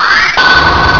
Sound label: fly metal; computers